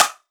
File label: drum hit clap; Crimson Stella